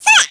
ROCK

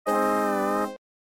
Bonus Game - Lose; Animaniacs; Genesis

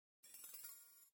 Jingle; Chiki Chiki Boys; Genesis

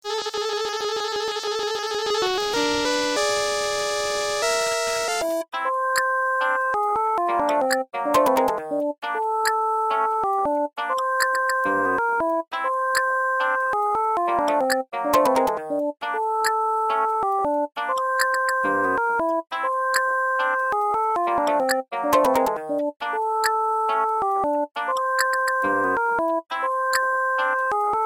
Final Boss Defeated; Quack Shot; Genesis

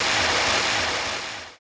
ROCK

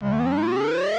SE SYS Count; Chiptune; Super NES Classic Edition; Nintendo; SNES

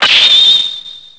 x victory; MMX4SFX

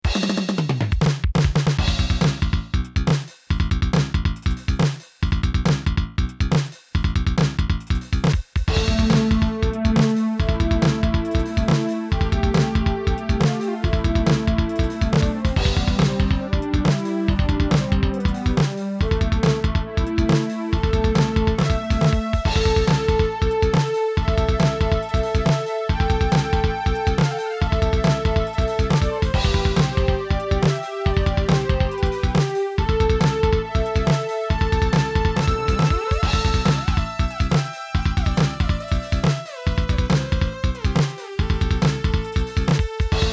Bass; Mega Man 7

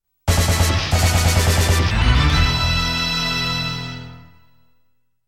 Pattern Clear BGM; Contra The Alien Wars

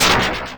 Medium Shot; mm7sfx

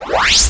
ROCK

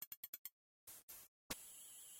Unknown Jingle; Sega Channel Demo Cartridge #4; Genesis

